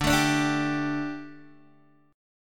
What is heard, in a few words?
D Suspended 4th D Guitar DADGAD